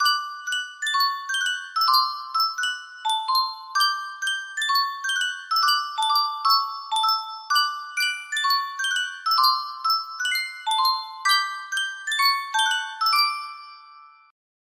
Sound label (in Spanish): Yunsheng caja de musica, music box melody